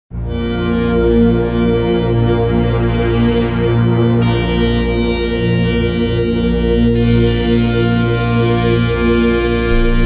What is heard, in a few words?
Eurobeat, Alt Mode Cover